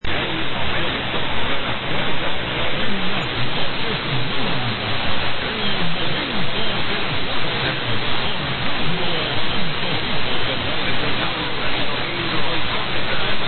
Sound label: Venezuela; 940 Radio Punto Fijo